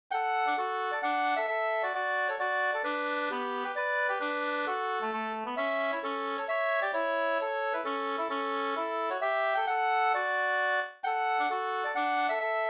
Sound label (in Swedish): mazurka